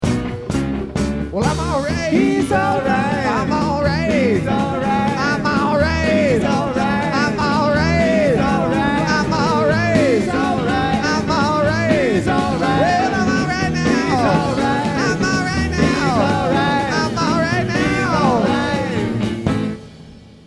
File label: Burlington, VT; Nectars